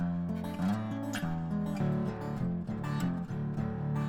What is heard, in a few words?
Guitars